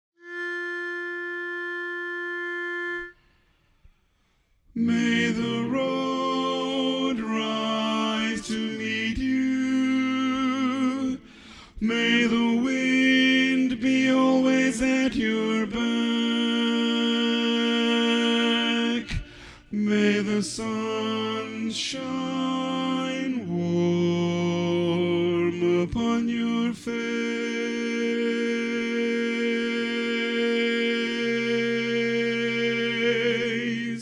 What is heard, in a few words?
Irish Blessing - Bari Loudest